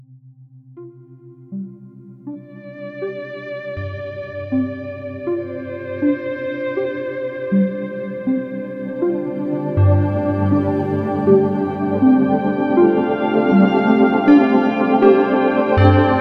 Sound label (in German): BB 7Hz mit Ambient Sound, binaural; Theta; Kreativitaet